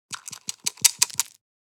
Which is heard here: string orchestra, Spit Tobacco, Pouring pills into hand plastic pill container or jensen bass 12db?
Pouring pills into hand plastic pill container